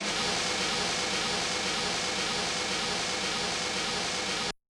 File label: Brush Swirl; Yamaha DJX-2B